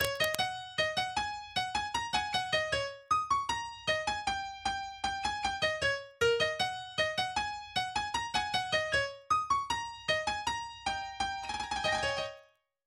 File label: Intro Lead DRY